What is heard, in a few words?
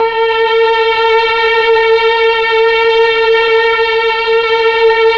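Mellotron Strings A#4, Slow Strings; Keyboards of The 60's and 70's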